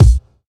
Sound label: present kick single shot b key, Royality free kick drum single shot tuned to the B note